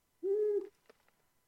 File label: Animal Call Owl